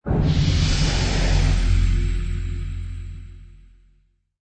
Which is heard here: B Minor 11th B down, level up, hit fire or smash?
level up